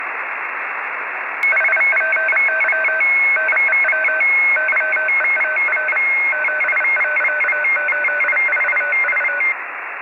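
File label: POPSAT, satellite; HIP1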